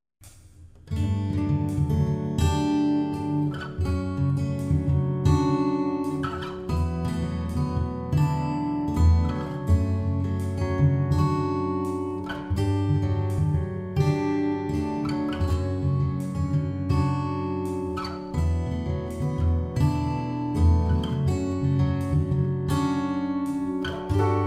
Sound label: Piano Guitar Transition